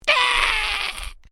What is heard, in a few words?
Created Creature Small Cry, Alien Sound Effects; Created creatures, vocalisations and pretend speech